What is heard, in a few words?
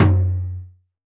Surdo Open, Percussion; Roland BK-5